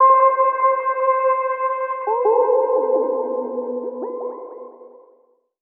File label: PAD Space Ape (C), One Shots; Cymatics; Mystery Pack Vol 4